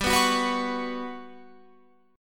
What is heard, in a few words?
G Major 7th Flat 5th, Guitar Open F; GM7b5; strummed; 19,18,18,18,21,20